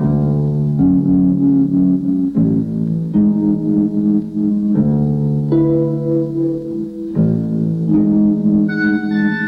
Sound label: bass, Loops, Sad